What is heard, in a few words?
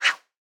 Throw Swipe